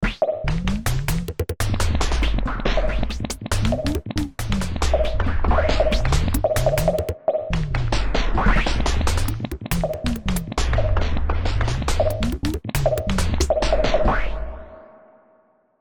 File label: Percussion Modular; Sunhouse Sensory